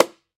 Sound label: M BONGO, World Percussion